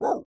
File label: dog growls and bark